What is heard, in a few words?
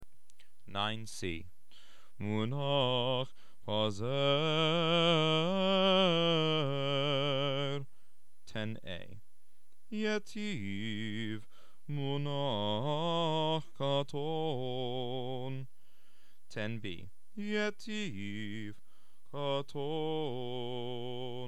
Haftarah Trope E